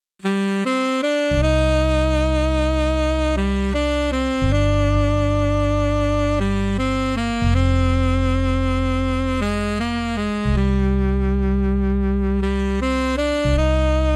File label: velvet saxophone